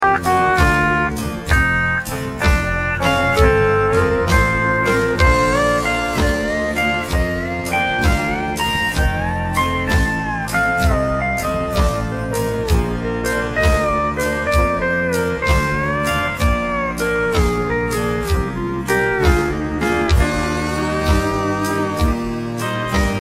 chop very slow, e9th

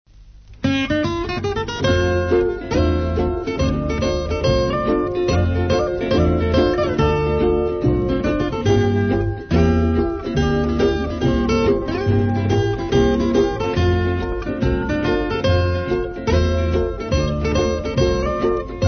Ukelele

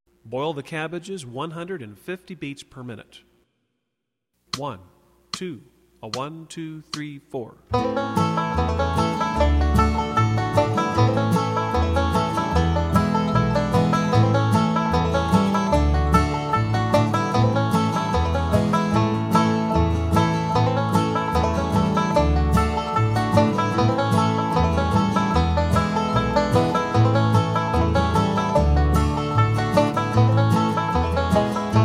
150bpm, banjo; Boil The Cabbages

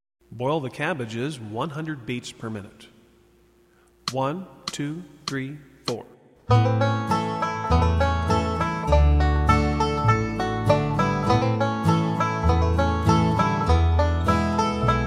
100bpm Solo, banjo; Boil The Cabbages

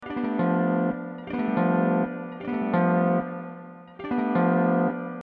backrake e9th tuning, steel

